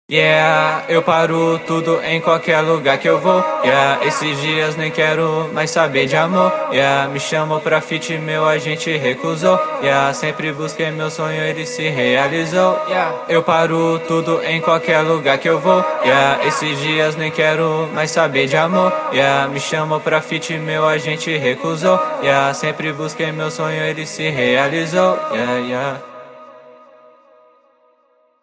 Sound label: Brazilian Trap, trap, 155, C sharp, Acapellas